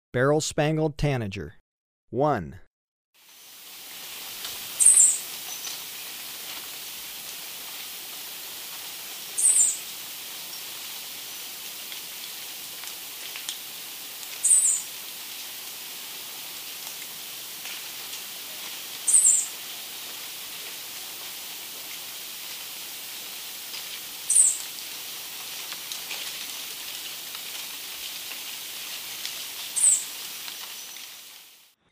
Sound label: Beryl-spangled Tanager, Birds of Ecuador; S. America